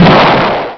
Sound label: explosion